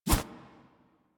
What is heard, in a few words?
Melee Block Stop; Warframe; Weapons; Whooshes